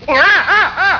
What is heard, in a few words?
ahah